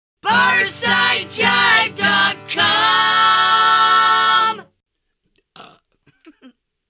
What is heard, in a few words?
burp ending